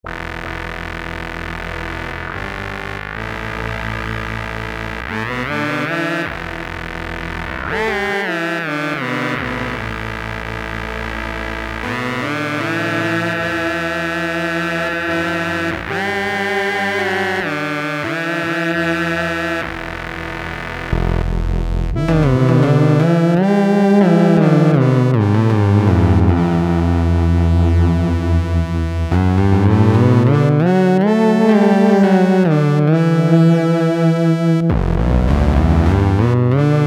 Poli Trombone + Mono Baritone Sax, Farfisa Syntorchestra